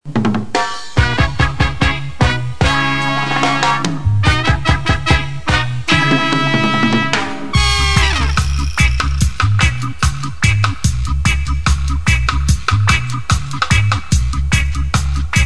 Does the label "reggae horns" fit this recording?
Yes